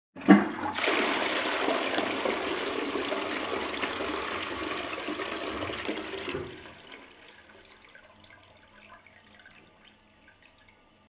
zvuk unitaza

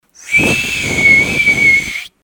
zvuk svista

